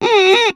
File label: cuica